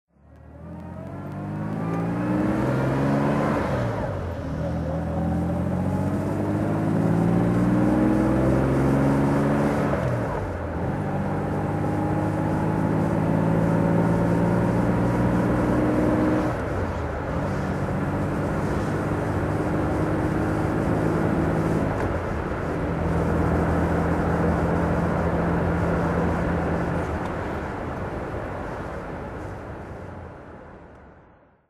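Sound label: jaguar xk140ots driving, Engine sound driving; Jaguar XK 140 3.4 Litre OTS, 1956; V3D/ ClassicarImages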